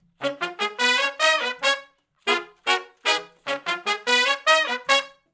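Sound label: R&B Riff (C#) unison to harmony, Horncraft; Sony Creative Software; Crimson Blue and Fabulous Horncraft 4 RnB; Horncraft for R&B; Sections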